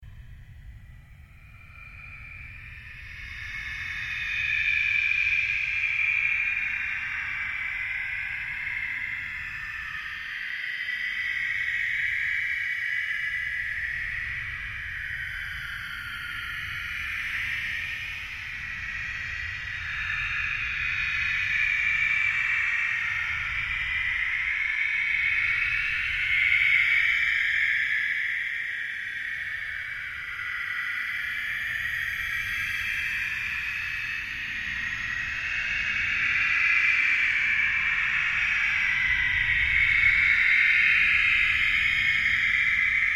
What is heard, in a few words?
Created Undulation Drone; sound building blocks created through manipulation of raw material; Rise And Dive